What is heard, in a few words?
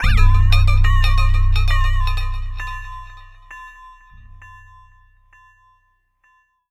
Crazed Bells